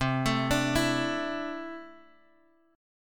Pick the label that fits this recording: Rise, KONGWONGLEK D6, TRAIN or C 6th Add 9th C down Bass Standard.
C 6th Add 9th C down Bass Standard